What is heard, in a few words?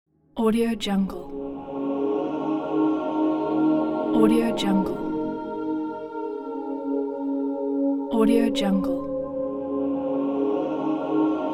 church choir